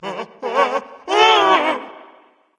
fear; smite; thanatos

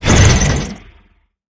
staff fire; smite; ahpuch skin10

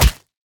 minion generic hit; smite; npc; roman archer

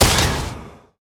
hit arcane; smite; god merlin

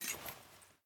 mvt armor; smite; gilgamesh

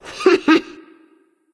nv heh heh; smite; voicepack; ratatoskr

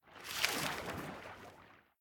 water under swim; sobek; smite

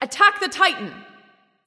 vox attack; smite

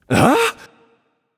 confused; smite; tsukuyomi

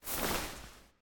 Armor; Smite; god Kumbhakarna

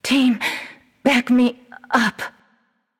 death; smite; nike; Buffed

